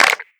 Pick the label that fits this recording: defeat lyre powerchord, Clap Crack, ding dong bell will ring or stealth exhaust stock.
Clap Crack